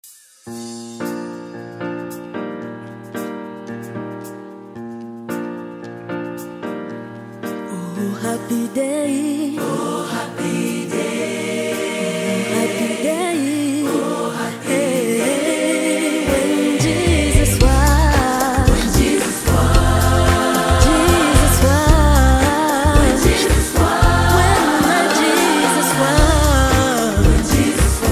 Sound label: Oh Happy Day Joyful Worship Music Choir; Imani Milele Choir